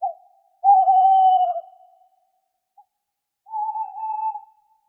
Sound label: Tawny Owl; SyrinScape